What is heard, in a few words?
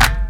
Clap